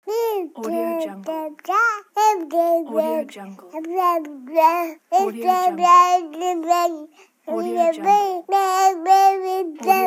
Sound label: baby talk